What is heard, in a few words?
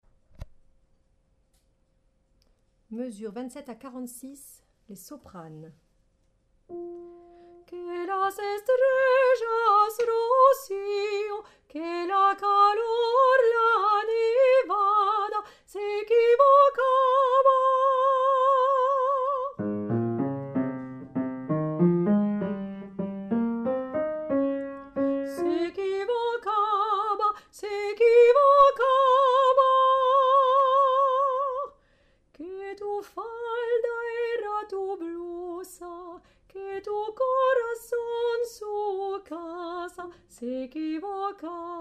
paloma Soprano